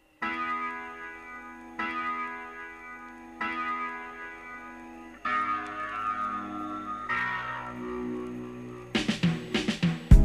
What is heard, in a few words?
Instrumental